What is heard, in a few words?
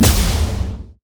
lux finalesfunkeln; LoL